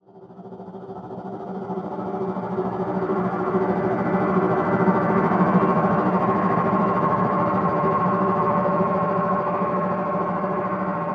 Terror Approach; Glitchedtones; Atmosfear

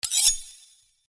Sword Sound; FXHome; Fighting Sounds

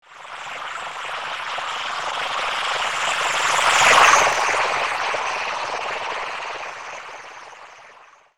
torpedo by, Underwater; Hydrology

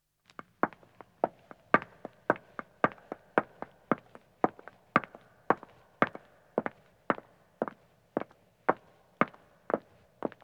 Stone Walking, 2 Women Start, Footsteps; BBC Sound Effects Library; Footsteps II

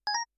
power up; Kenney's Sound Pack; Digital Audio

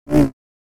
Saber; FXHome

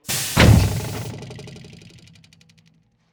Porsche 911 GT3 Garage Air Jack Release; AUTO RACE; ec fields

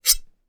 gore - large metal knife muted ring; Timothy McHugh; Gorification [HD]

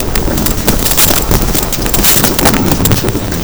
Rhythm

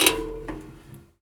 metal clang; Sound Design Tools; Raw Foley

